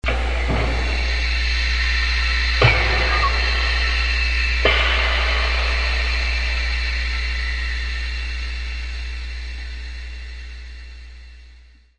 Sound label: factory full cycle, Factory Percussion